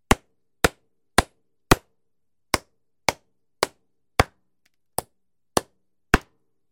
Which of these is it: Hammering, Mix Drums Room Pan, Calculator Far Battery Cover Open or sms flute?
Hammering